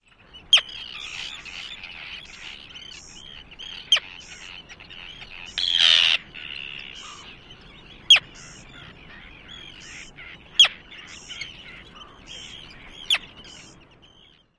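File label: Arctic Tern kip and keeyar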